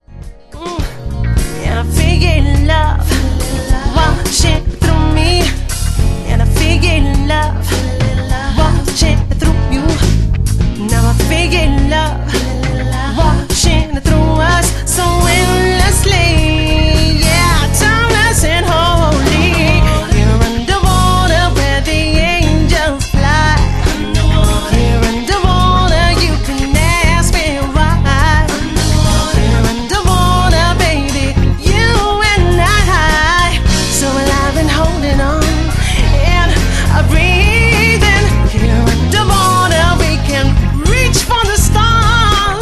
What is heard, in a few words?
underwater